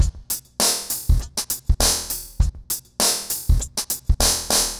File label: Remixed Drums 100BPM; The Roots Drumloops